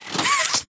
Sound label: hand retract; Poppy Playtime